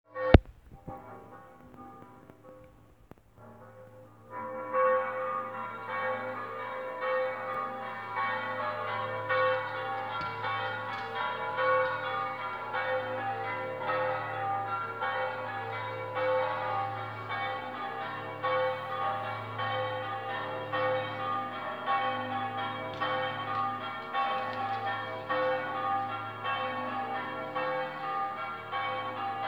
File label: church bell